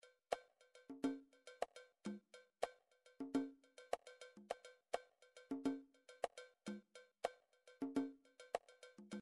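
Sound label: drums conga cowbell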